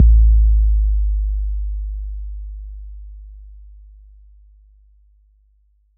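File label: bass drum 808 Decay F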